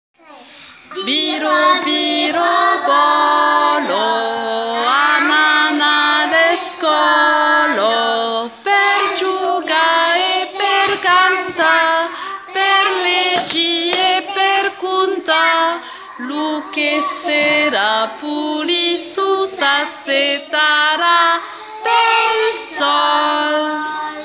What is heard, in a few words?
Vocal